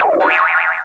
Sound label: dong echo; Boing; club veryrichgeezer